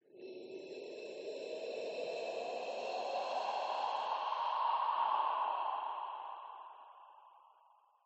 breath exhale low soft ascend end descend slight reverb eerie; skyclad sound